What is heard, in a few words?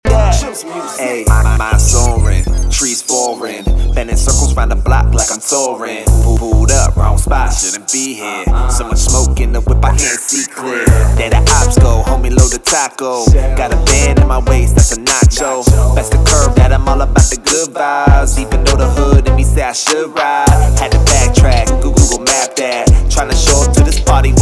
Rap Sample; male rappers